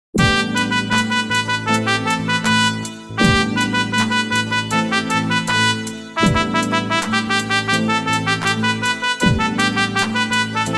walk band instrumental ringtone mobile piano remix